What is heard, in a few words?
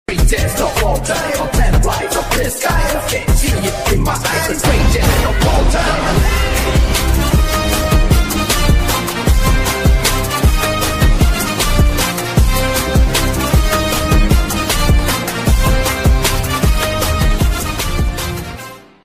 bgm remix ringtone